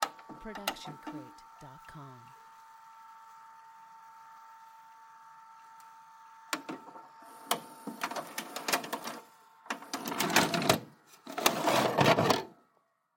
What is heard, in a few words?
VHS input play stop